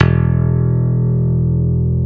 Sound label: E0 LONG, STIK BASS; D-STIK BASS1